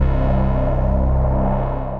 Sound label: Bass Pad Cicadas, brooding bass; Instruments; Ableton; Factory Packs; Mood Reel